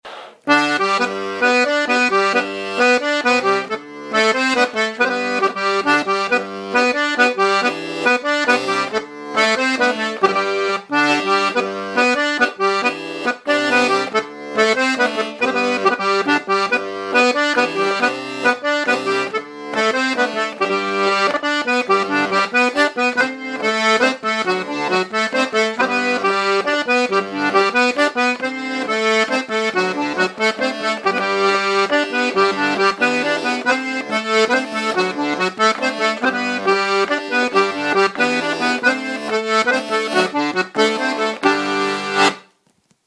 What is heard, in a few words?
Mazurka de Lapleaux